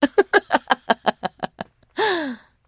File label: trader laugh; 商人杰恩（七日杀）